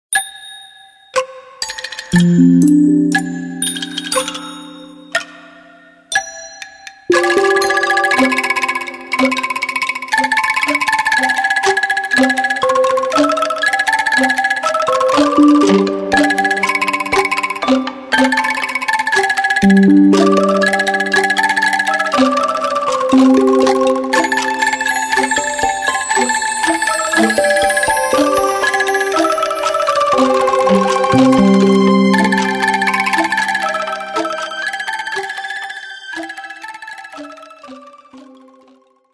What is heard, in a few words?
Angklungs